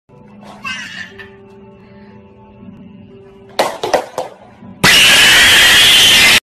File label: Baby Lifting Weights